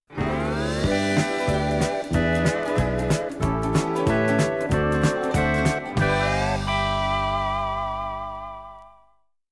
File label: Hawaian, jingles